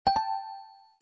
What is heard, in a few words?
dingdong